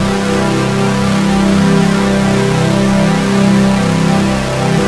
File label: OBI DRONE KORD; Zero-G Ambient 1